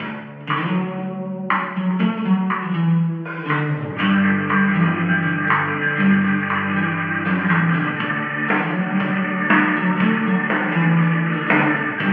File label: Garage Retro Style; euro truck simulator 2